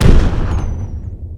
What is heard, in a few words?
explosion; plague